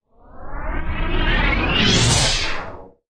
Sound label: Energy Sweep